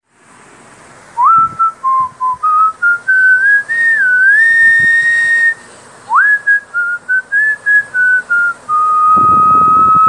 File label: Whistle Instrumental